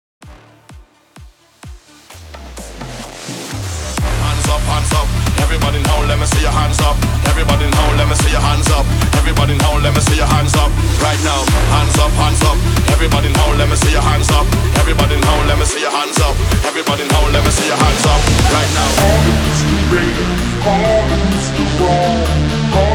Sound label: 128 BPM